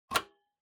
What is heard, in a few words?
PC Hardware USB Unplug